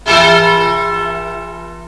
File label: Bell; OldBells